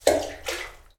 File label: water splash flooded bunker; sound effects one